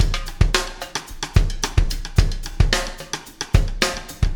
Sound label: funky beat